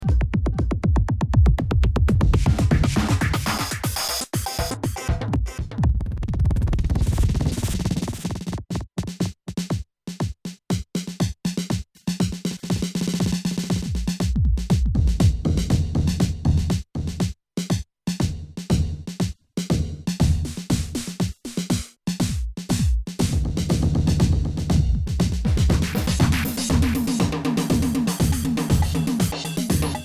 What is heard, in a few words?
square sounds; cant find laptop charger cuz; weeklybeats 2014